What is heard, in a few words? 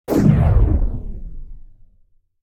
Weapon Sci-Fi Firing; Hollywood Edge; sound designer's toolkit v2